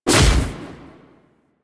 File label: Shield Knock Down; DE; Warframe; Garuda